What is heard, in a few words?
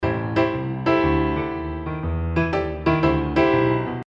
piano; Weed Shop 2; iOS Windows gamerip 2017